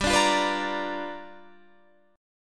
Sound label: G 7th Sharp 9th, G, chord; strummed; 15,17,15,16,15,18